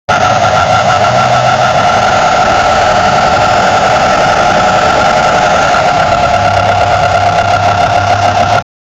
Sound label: distant f1 engines growin; motorsports